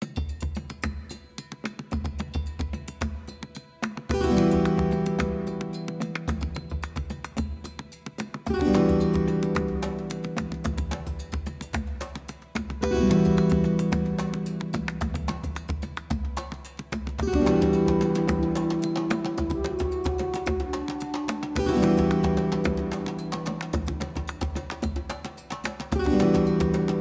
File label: Forest Alternate Music; Shu; ps vita ps4 switch windows